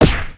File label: Gorilla Hit; creatures organic